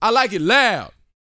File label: VOCAL F; ILIO